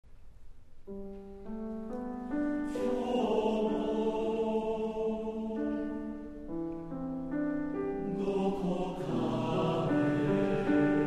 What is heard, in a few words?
Interlude, TTBB chorus male